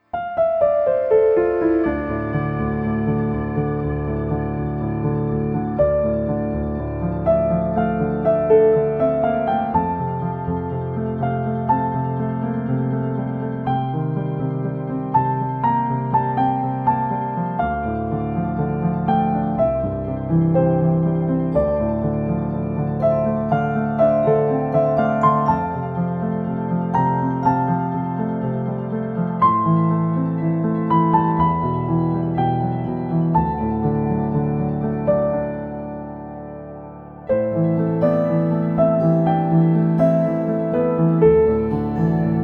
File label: Battle II; octopath traveler